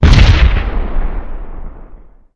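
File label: rocket launcher explosion; quake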